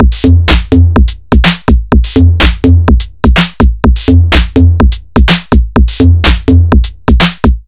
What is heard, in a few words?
125 BPM, Digital Breaks; E Funk Daddy; Loopmasters; Jon Carter & Alex Blanco; Block Party Essentials